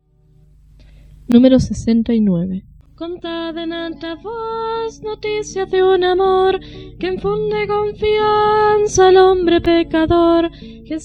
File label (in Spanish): Coro, himnario niños